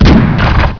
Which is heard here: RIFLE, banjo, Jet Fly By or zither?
RIFLE